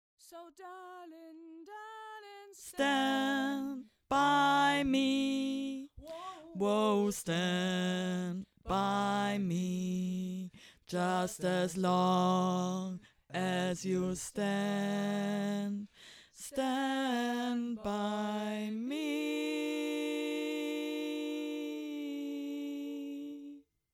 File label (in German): 2te Stimme